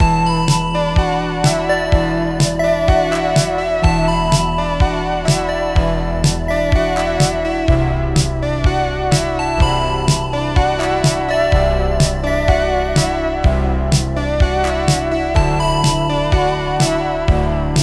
synthwave

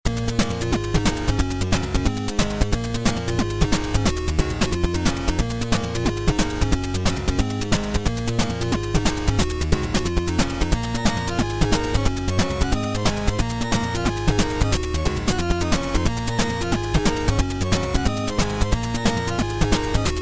bgm action

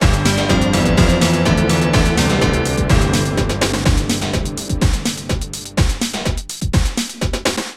hope jingle

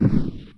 stomp

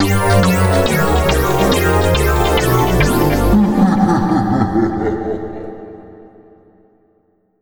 jingle

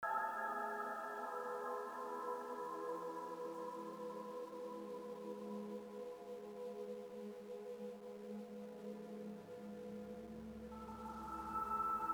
ambient ominous

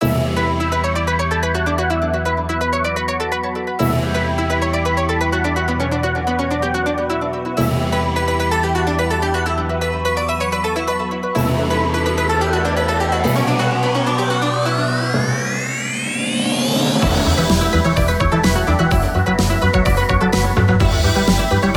Rain of Lasers

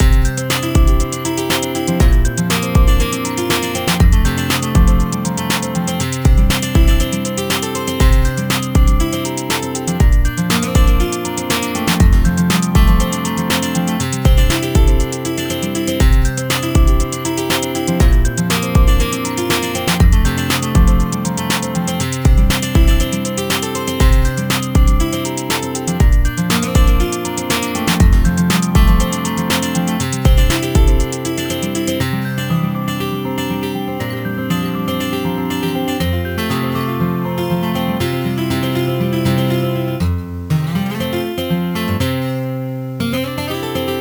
8bit attempt

